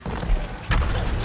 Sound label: stone block; ori and the blind forest